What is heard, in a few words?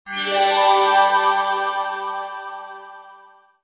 Level Up